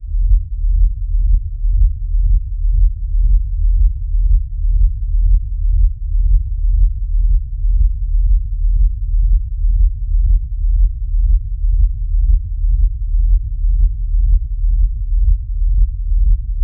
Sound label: sound a deep vibrating hum, creature